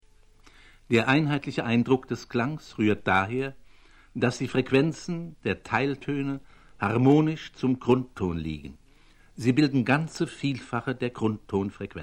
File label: filter; eimert